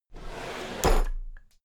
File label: drawer cabinet equipment open heavy